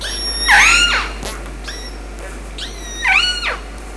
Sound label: orca